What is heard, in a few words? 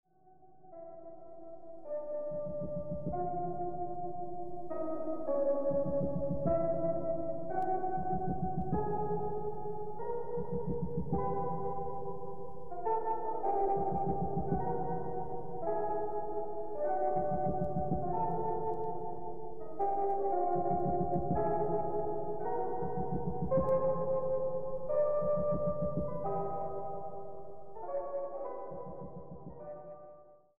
radiophonic music; bbc radiophonic workshop